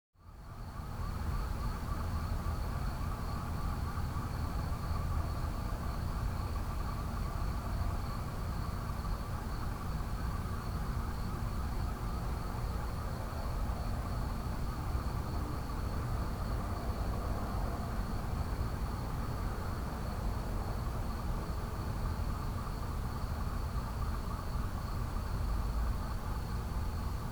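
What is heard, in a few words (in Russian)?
Ночь в городе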